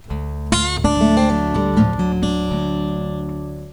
exclamation question; PLUS! Themes

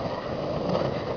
drag

